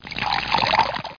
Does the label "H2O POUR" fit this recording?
Yes